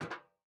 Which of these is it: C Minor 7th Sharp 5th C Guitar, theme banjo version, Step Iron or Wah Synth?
Step Iron